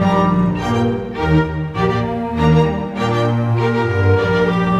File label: Cinematic Strings, Orchestral; Sonic Foundry (Sony Creative Software); Orchestral Series VOL-3 - Cinematic